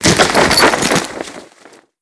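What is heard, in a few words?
destruction luggage burst clothes; Explosions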